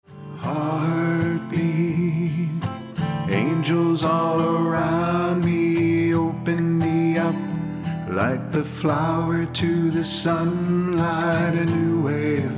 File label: Heartbeat